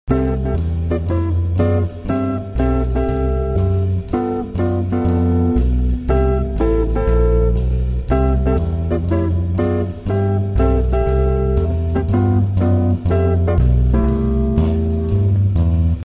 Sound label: Right-Handed Drop 2 Block Chords, Stick; Drop 2 Mary Had a Little Lamb!; Shapes